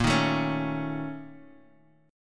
A sharp Minor Major 7th Double Flat 5th A sharp Guitar Half Step Down